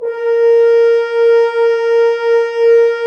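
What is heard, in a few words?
French Horns f, Dry; Roland LCDP06 Brass Sections; F.Horns 2 f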